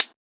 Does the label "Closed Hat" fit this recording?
Yes